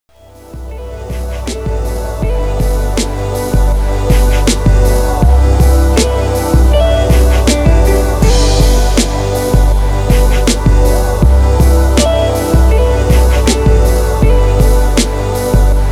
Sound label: Instrumental